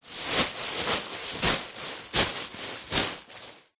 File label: plastic packaging sheet short handling movement stuffing; foley